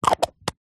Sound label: container small plastic lid close